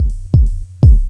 DRUMLOOP, Ragga & Dub Reggae

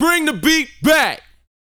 VOX, Vocal, R&B Dance, HIP-HOP; Spectrasonics Vocal Planet

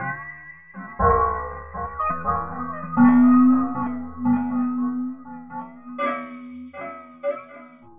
Tape Arp; Sonic Foundry (Sony Creative Software); Ma Ja Le Ethereal Textures

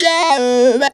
"Yeahhhh", Funky Vocals; USB Soundscan vol.18; TB MELODY

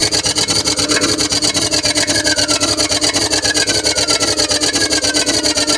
MACHINES

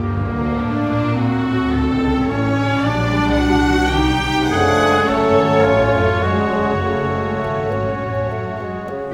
Cinematic Orchestra

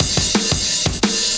Flat 175, Breaks, Drum&Bass; EZRollers

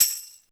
Tambourine; AMG; Perc One Shots

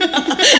Laugh; Zero-G Jungle Warfare 1, 2 & 3; 90 s Sample CDs

